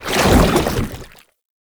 Goo Layer, Footsteps; Warframe; Lasrian; Lasrian Soldiers; Foley; Lasrian Chem Strike Nox

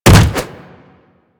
Jump Attack Default; Footsteps; Warframe; Tenno; Wall Run

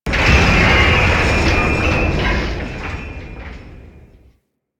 Object Rattle; Warframe; Infested

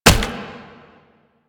Step; Warframe; Proto Infested Brachiolyst

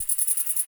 Forest Animal Close; Ambience

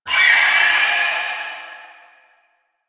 Blade And Whip Heavy Vocal; Weapons; Melee; Warframe; Dagath

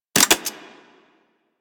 Vomit Gun Mech, Weapons, Infested; Warframe

